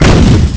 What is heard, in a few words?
CRASH; EXTREME